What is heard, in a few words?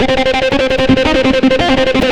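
extra lead (single note trill); Sonic Foundry (Sony Creative Software); Ugly Remnants VOL-2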